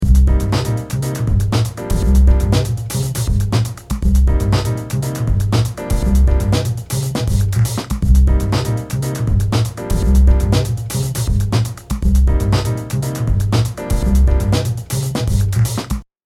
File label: jazzfunk